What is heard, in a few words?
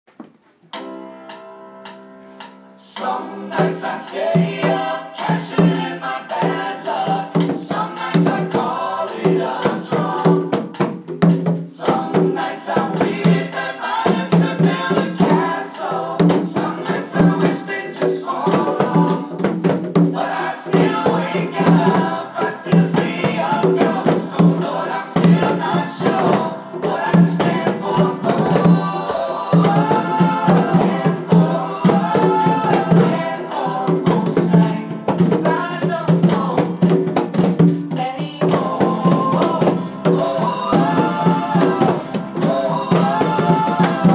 rhythms